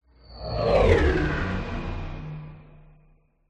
science fiction craft pass by robotic mechanical futuristic